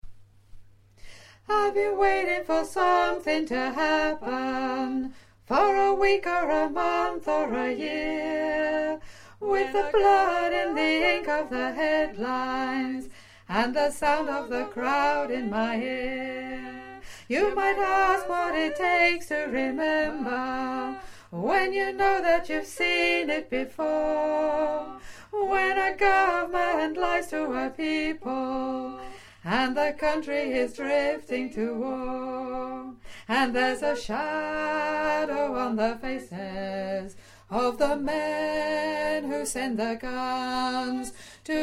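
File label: mix soprano & alto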